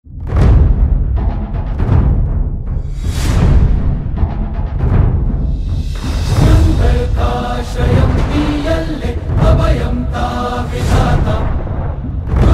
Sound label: Malayalam; Adipurush